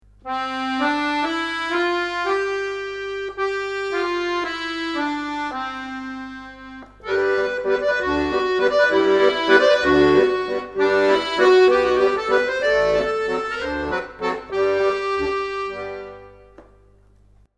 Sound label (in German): Akkordeon Klangregister Tremolo